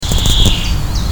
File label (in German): bergfink